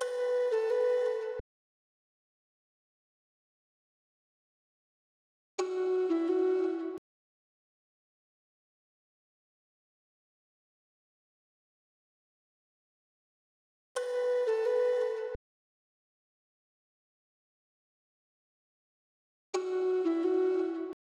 172 BPM B Min Flute; RnB; Melody; Loop Stems; Cymatics; 2022 Melody Collection; Houston Dancing

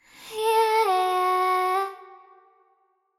Chant, Vocals, Wet; GhostHack

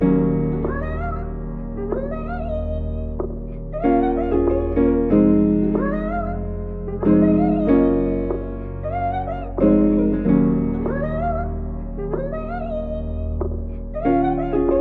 94 BPM A# Min; Cymatics; Zodiac Vol 2 Beta Pack; Zodiac Vol 2 Premium Compositions

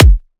Riddim Kick

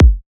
Kick A, Psy Kick; Drums; Ghosthack; Ghosthack Advent Calendar 2017 Day 9 - Psy Kicks; Ghosthack 20 Free Psy Kick

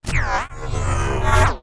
wooshes transform sweetener

